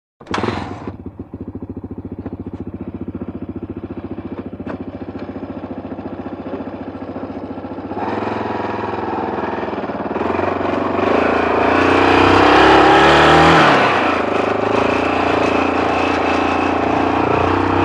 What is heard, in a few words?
On Circuit Trials Bik, trials bike